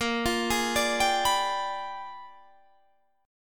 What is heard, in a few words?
Bb 13th Flat 9th; Bb13b9